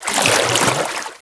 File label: WATER MOVEMENT